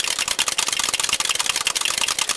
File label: Score Clock; Finding Nemo; XBOX